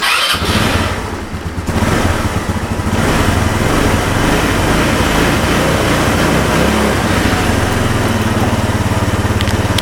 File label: Moto